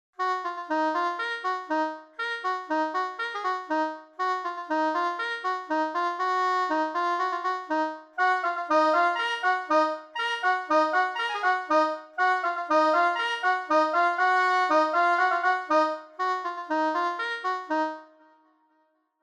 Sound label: brass soprano